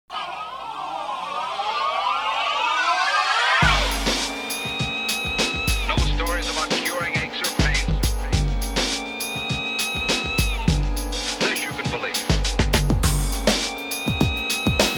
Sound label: hip hop rock